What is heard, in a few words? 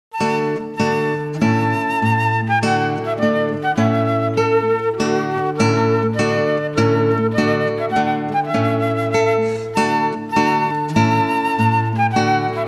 Trumpet Tune; Purcell